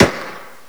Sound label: mp40 attack; WAR